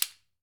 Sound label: AFRICAN, African Perc; E-MU Formula 4000 Series Vol. 4; Earth Tones